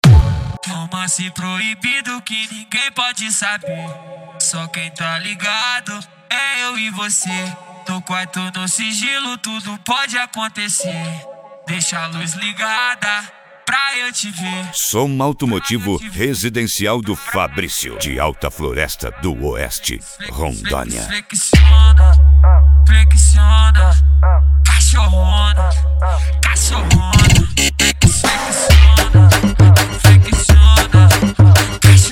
som automotivo funk bass

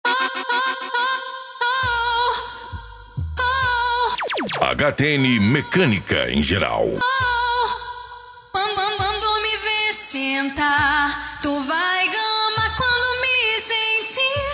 funk e lambadao

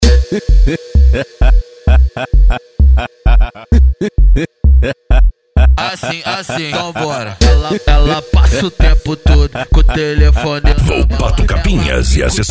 funk consciente e hip hop

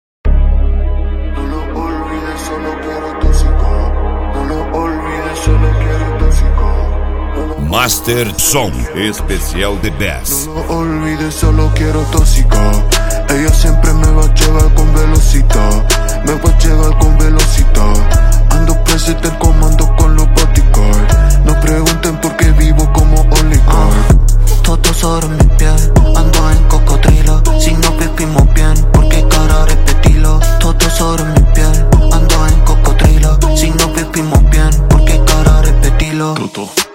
bass

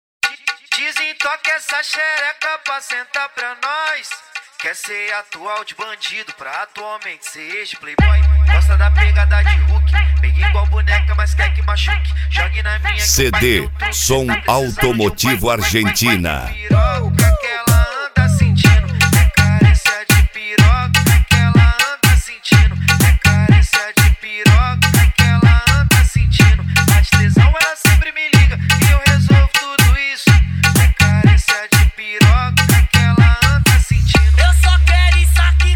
som automotivo

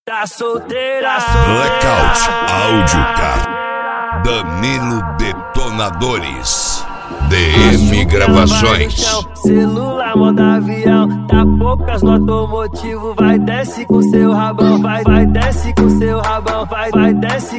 eletro funk

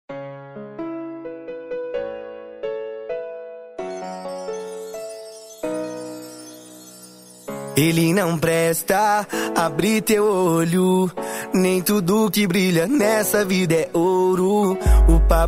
funk e sertanejo

